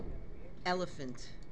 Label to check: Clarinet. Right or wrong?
Wrong